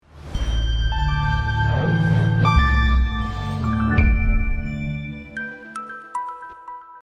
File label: boot sound; Zen; Admire-Swadesh